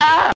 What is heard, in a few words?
ah, Voice ooh ahh